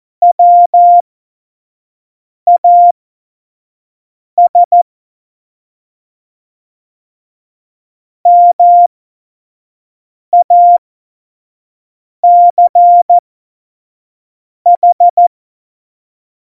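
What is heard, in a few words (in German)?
Witz, Morsekurs, cw morsen; Tempo 25 F70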